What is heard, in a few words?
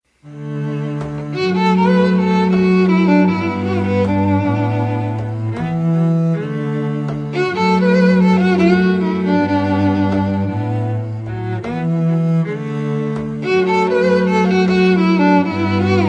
String Quartet